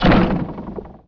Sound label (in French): Tir de catapulte